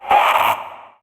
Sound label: Possessed Red Veil Vocal Pain; Warframe; PriestQuest